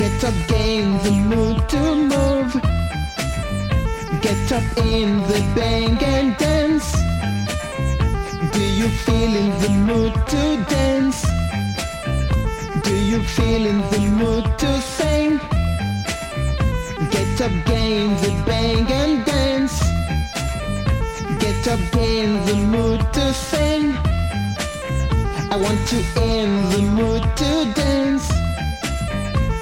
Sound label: Afro Funk Disco Boogie West African Disco